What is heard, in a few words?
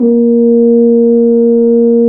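brass, F.Horn Swell; French Horn; F.Horn 4 Ln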